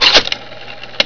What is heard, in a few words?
DIALOLD, MACHINES